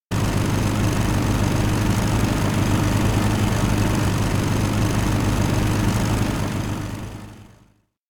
elec plane engine; anemoi

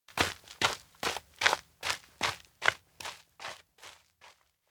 steps outdoor soil leave; ken; anemoi

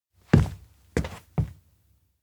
steps indoor broadcast proud step; anemoi

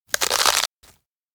corn bite; anemoi

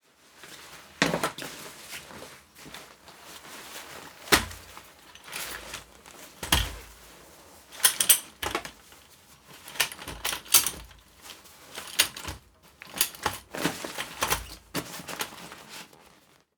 folding tent; anemoi

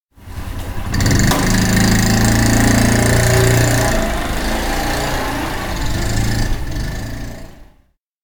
bike start; anemoi

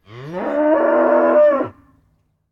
cow voice; anemoi

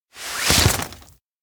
offer umbrella; anemoi

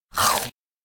dog bite; anemoi

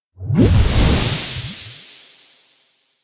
elec bubblecosmos; anemoi

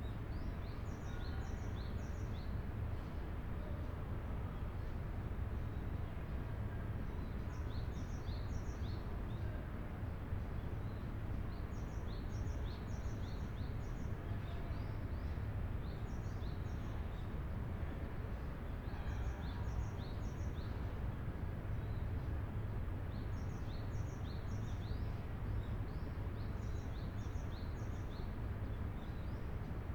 indoor observatory morning; LOOP; anemoi

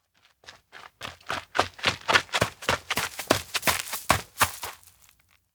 steps outdoor hill run up; koyomi; anemoi

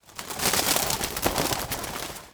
bird rampage; anemoi

